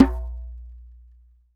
DJEMBE OP

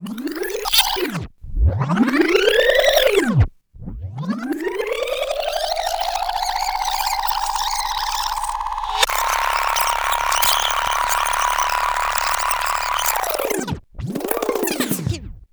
TAPE FF RW; ENVIRN-AMB

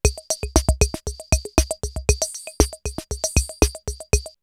CR78 R77 BeatBox, drumloops, DrumMachines; X-Static Goldmine

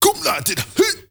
MALE RAV, MALE NOISE, Heart of Africa

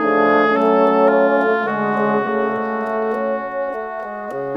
Brass Winds; Sonic Foundry (Sony Creative Software); Orchestral Series VOL-3 - Cinematic

